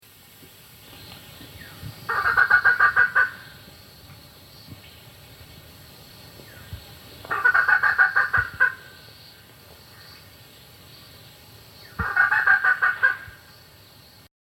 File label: germains peacock pheasant